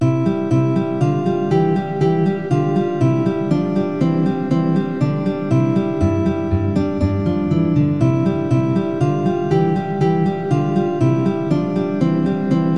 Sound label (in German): gitarre solo; freude schoener goetterfunken